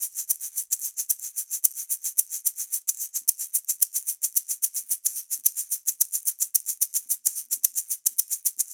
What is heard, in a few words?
afro shaker loop, 110; perc construction loops; shakers afro; SEISMIC Drum Sample Pack; Slate